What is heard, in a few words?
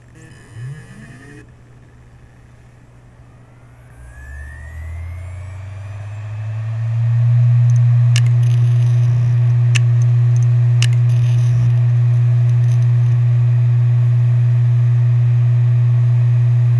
seagate 7200.11